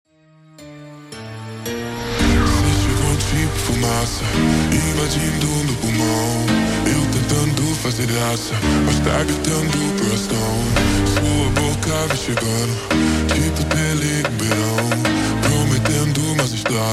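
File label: ultra Slowed, phonk music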